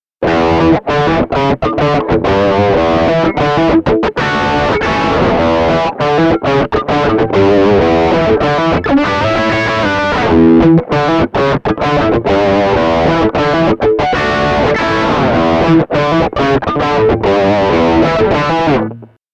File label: PAF Bridge Drive